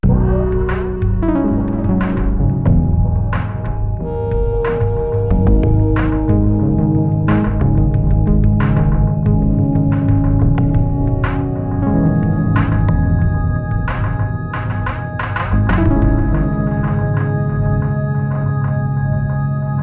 Jump; Laterous

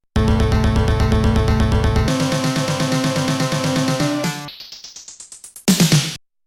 Level Start; human killing machine amiga

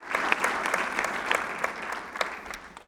AUDIENCE Clapping Hall stereo; Don't Be Afraid The First Toy

